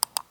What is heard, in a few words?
Mouse Click Single